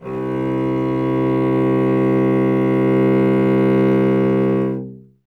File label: STR cello C, Cello; Miroslav Vitous; Solo Instruments VOL-1